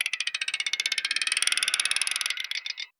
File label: the spinning